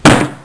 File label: Thud